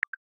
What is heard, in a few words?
Text Selection, ui; Galaxy S9